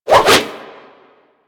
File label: Sword And Whip Spin Whoosh; Warframe